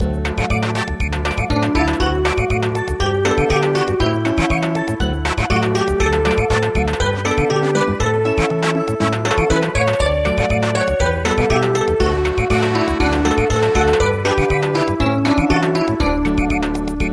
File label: Samba; Lullaby